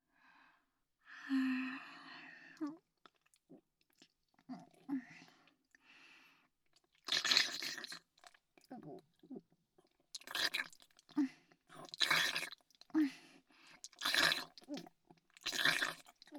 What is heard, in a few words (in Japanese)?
フェラチオ; SEあり